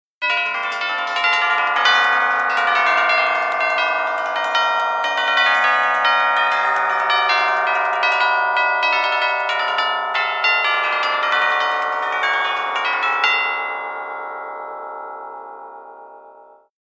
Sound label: orchestral chimes solo